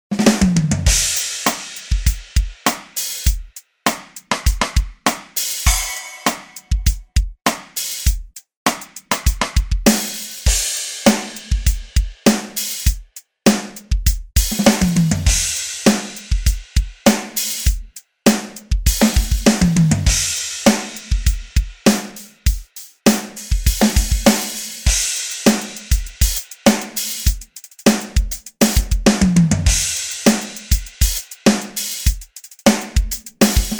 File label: solo, drum kit